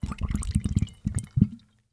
Liquid Water Water Bubbling From Plastic Bottle

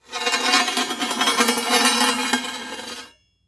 Impact Spade Drag On Wood

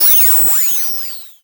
Animation Electronic

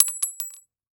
Weapon Bullet Shell 7MM Dropping On Cement; AdobeCC

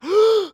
Human Male Gasp

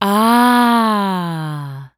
Human Female Says Ahh

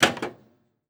Electronic Pinball Machine Flippers Single; AdobeCC

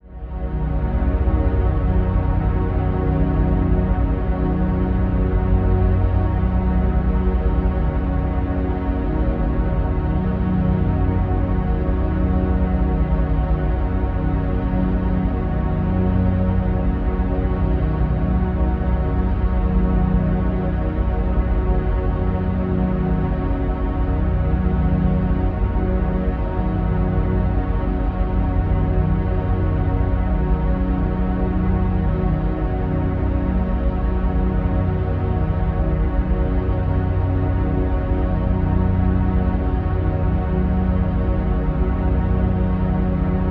Drone Synth Stab Musical Tonal Strings; Production Element Imaging; AdobeCC

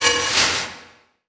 Transition Hit Impact Electrical

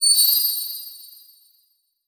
Transition Bell Hit; Adobe; Production Element

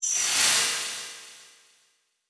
Title Transition Swell; Production Element; AdobeCC

